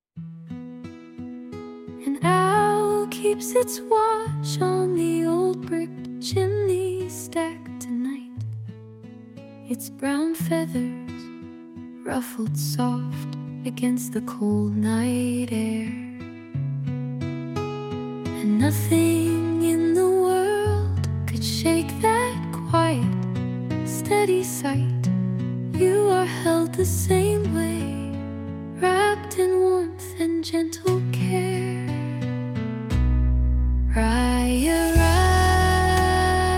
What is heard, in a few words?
lullaby, name songs; raya